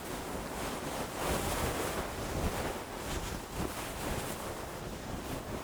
Flag or Fabric in Wind; loop; mono; Universal Sound FX; CLOTHING

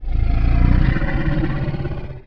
MONSTER Growl Deep Long; MONSTERS & CREATURES; mono; Universal Sound FX

